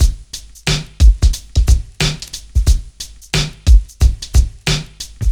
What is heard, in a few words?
Loop